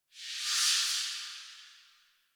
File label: Crafting UI Close; Zariman